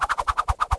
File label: savage impact